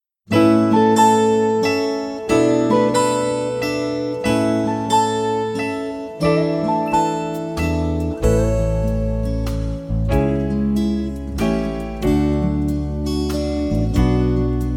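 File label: Karaoke accompaniment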